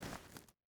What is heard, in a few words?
footstep hide stones walk; Rust